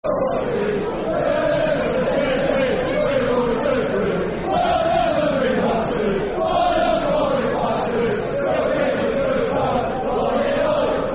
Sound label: Football Chant, Soccer Songs; Forever And Ever; Leicester City Lcfc